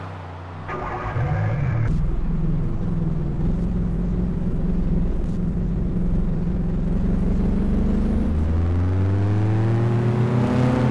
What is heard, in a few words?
honda civic eg6 si na; 1994